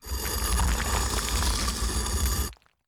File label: throne open; adams venture origins; Kismet